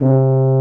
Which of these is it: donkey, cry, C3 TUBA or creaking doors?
C3 TUBA